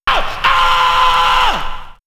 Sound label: Aargh; X-Static Goldmine; house&rapvocals